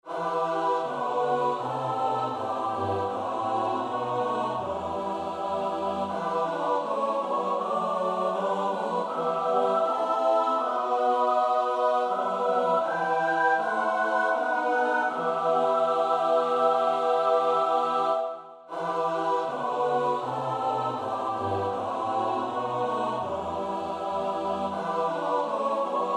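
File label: soprano alto tenor bass; Bach (Johann Sebastiaan); BWV 081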